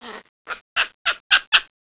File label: monkey; cstrike; copan